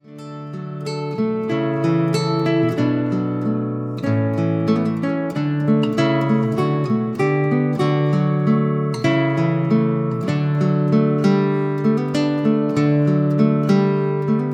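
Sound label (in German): Nun ruhen alle Wälder, Gitarrenbuch; Paul Gerhardt Gitarrenbuch ohne CD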